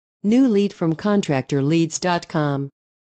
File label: whisper